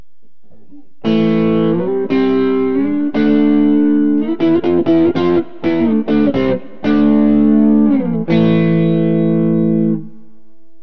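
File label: classic 57 neck, guitar pickup; burny; fugly clips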